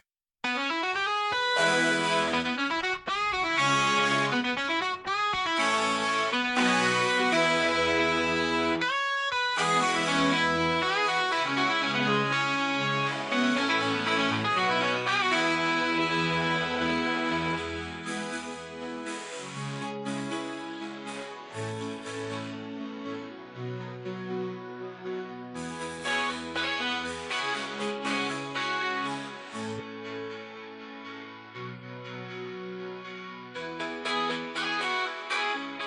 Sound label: Synth